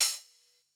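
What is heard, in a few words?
closed hi-hat; eddyflux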